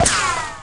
Ricochet, Impacts

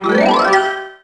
Pickup

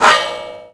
grind metal off; terrains; Tony Hawk's Project 8; XBOX

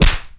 hitface; skater; Tony Hawk's Project 8; XBOX

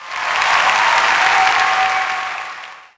POS Reaction,Crowd; Cars; XBOX

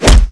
hit high

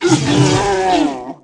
Gado Pain, Aliens

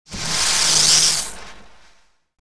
shot death, flechette; weapons

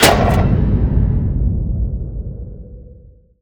unlock; Totaled; XBOX

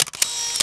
Camera; Grand Theft Auto Vice City; XBOX

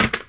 bad part stone, droids robots

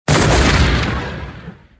death; Star Wars Jedi Knight II Jedi Outcast; XBOX; probe

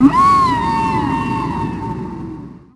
Whale Call; Finding Nemo; XBOX

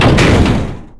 Sewer Wheel Lock; Finding Nemo; XBOX

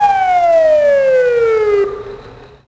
Police Wail Siren shutoff; cars; Max Payne

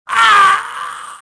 death; Star Wars Jedi Knight II Jedi Outcast; XBOX; jeditrainer

